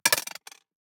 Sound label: DICE on Glass, Throw and Roll, Metal, Two Dice; Samples; ArticulatedSounds; DICE Sfx Party (Extended)